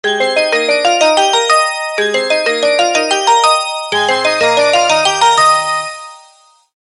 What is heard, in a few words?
Tone